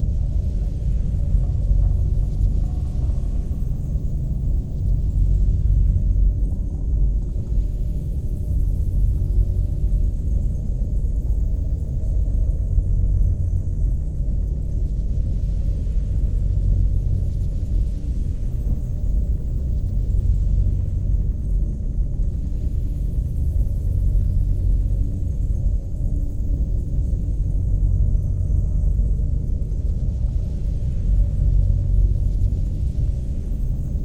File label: megastructure hyper relay idle; Stellaris; overlord; Megastructures